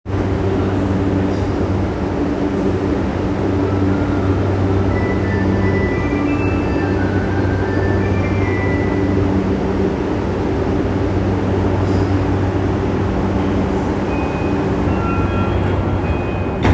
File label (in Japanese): 南北線西ケ原南